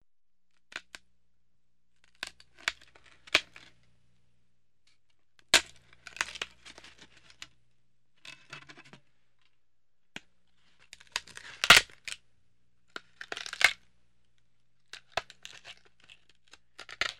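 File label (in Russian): треск дерева дерево ломают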